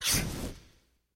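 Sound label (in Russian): огонь из пасти дракона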